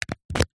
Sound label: nicotine spray dispenser child lock close lock